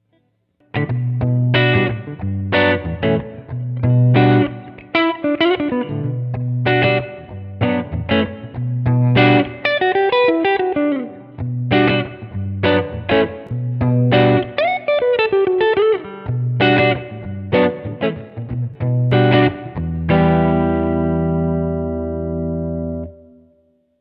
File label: room; BigSky; reverb; Rhythm House